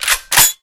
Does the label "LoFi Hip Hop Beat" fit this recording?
No